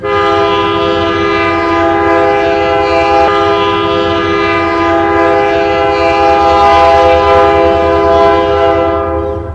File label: horns; cp k3l; run8 v3